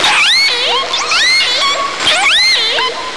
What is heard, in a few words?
Southern Resident Orca Vocalization; Lime Kiln